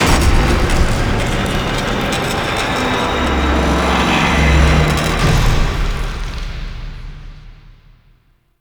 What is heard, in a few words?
Capture Obj Landing Pad Lower; Warframe; Grineer Forest